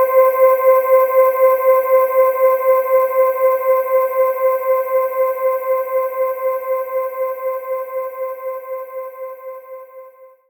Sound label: Synth Vox Oohs C3; knights try